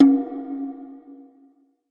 Processed Ethn Perc, ETHNIC and WORLD PERCUSSION

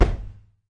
cushions kick; Kicks; single hits

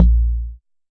Jomox MBrane, drum machines; Jomox MBrane 11

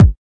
kik

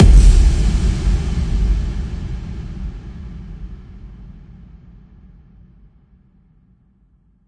huge speaker exploding impact; Kicks; single hits

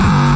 obnoxious kick

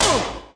voice uh clap; single hits

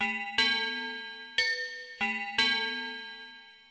Bells

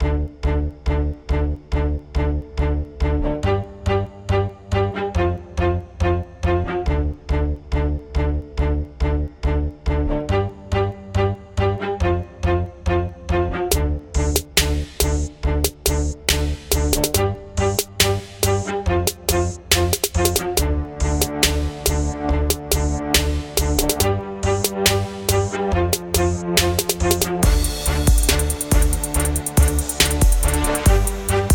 hornets

